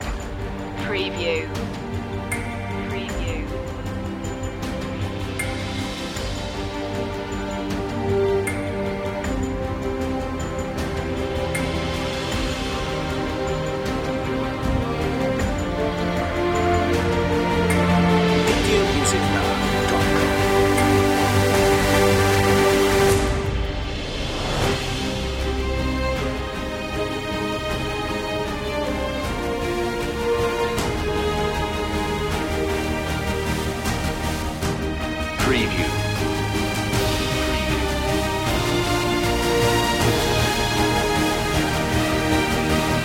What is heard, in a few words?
no choir